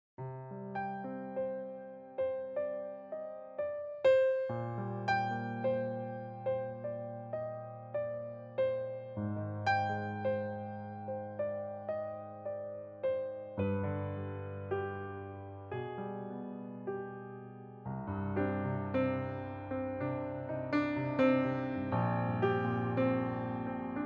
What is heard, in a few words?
Reflection Piano